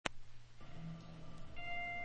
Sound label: Flames